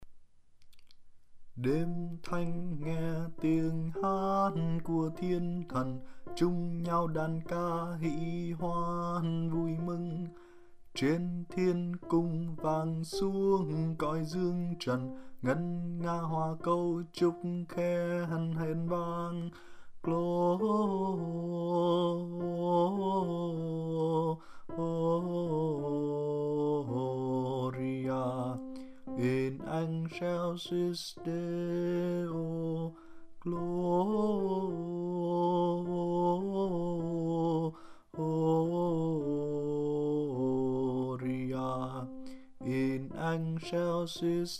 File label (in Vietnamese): Tieng Hat Thien Than Alto; Giang Sinh 2020